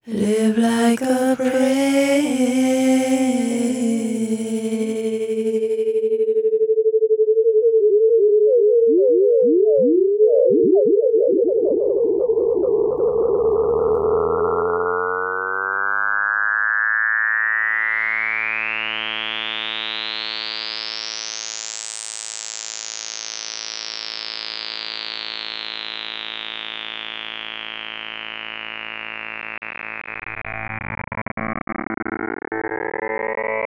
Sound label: partikkel long morf